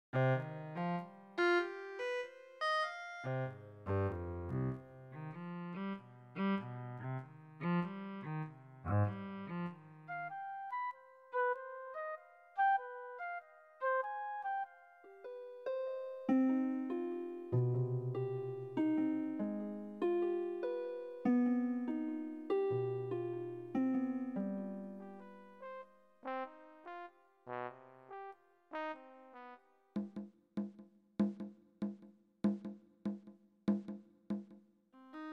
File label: merry dance